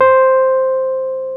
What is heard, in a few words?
WURLY C4, Electric Pianos; E-MU Formula 4000 Series Vol. 3; Analog Odyssey